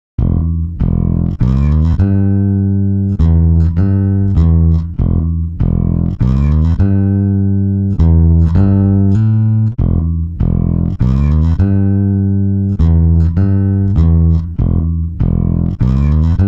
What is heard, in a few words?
bass, timestretch; prosoniq time factory mpex3